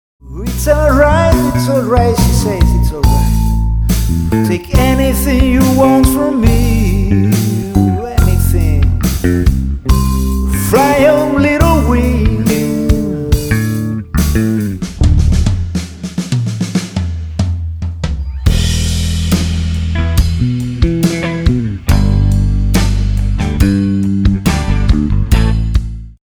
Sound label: no Guitar